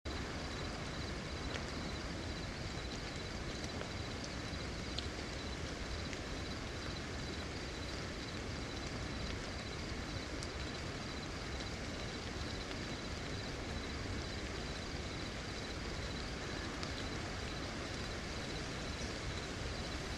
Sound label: driving rain